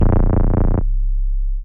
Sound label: Stabs, Elektron MachineDrum